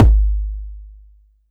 BD

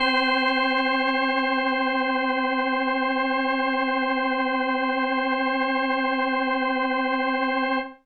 Organ C3; Kawaii

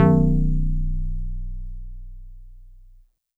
StarPiano DX100 B0

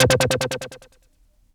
WASP Vinyl Synths C3; WASP Insect Bling; vinyl leads

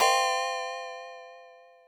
synth bell synth interval

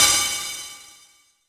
Ride Cymbal

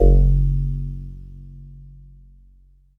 Jazz Harp Kawaii Fs0; keys

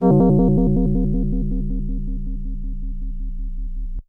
FM Bell FX DX100 C3

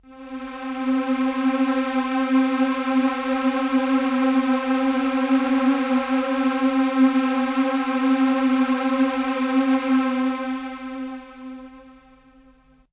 M1 Strings Detuned Vinyl Synths C3; vinyl keys

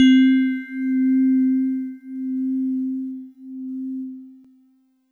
DX100 C4; David Lynch

